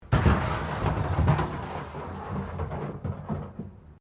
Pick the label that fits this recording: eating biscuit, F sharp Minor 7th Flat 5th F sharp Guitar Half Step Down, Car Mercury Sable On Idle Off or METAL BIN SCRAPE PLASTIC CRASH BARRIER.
METAL BIN SCRAPE PLASTIC CRASH BARRIER